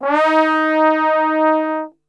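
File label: D#3 trombone gliss, Brass; Best Service; Brass Super Section; TRMB GLISS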